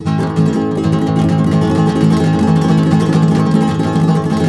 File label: A# MJ, Guitars; Spectrasonics; Hans Zimmer Guitars VOL-2; FLAM TREM CH